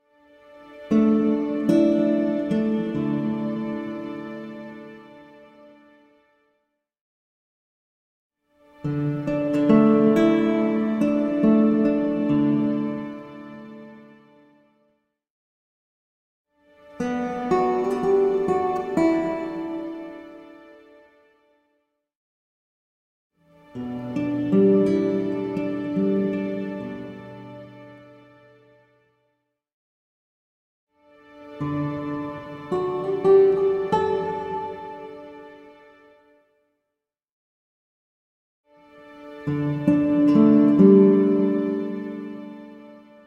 Music logo - Slow classical guitar, with string pad x6; Hollywood Edge Sound Designers Tool Kit